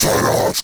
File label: Cast Vocal; Warframe; Uriel; Remedium